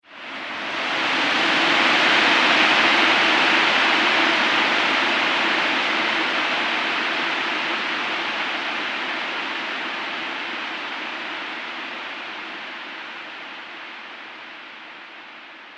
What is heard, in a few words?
Winds Of Desolation, Scary Soundscape; Andrew Fleming